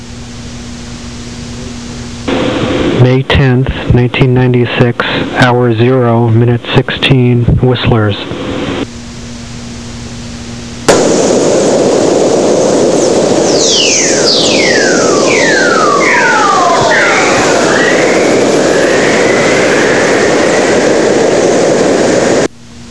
whistler

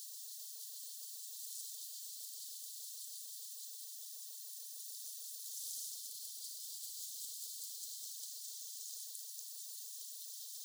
Pygmy Killer Whale

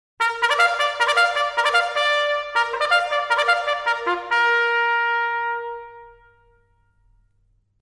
Officers Call

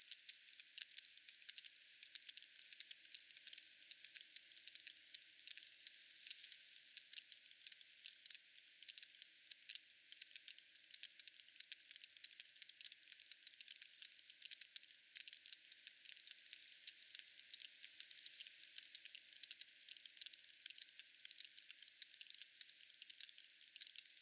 Sperm Whale Normal Clicks

